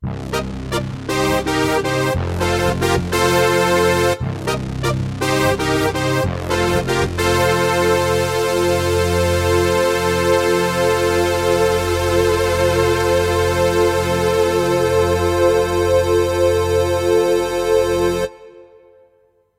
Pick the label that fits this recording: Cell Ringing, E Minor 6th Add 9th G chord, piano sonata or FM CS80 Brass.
FM CS80 Brass